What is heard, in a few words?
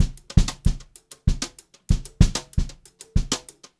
Brushed Drum Loops; USB Soundscan vol.39; AKAI